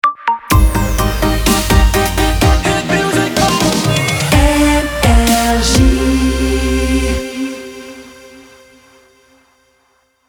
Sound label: Jingle Shotgun; NRJ